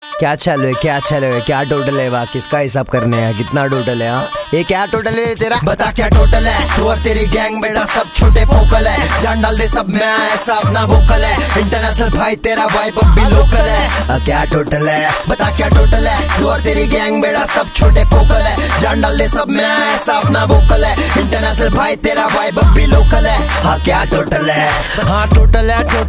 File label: Ringtone